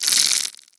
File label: poison shoot warning